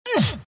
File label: wraith pain; dota; skeleton king